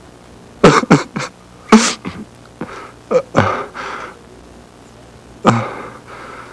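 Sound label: CRYING; persone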